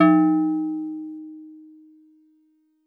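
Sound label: Steel Drums DX100 D#6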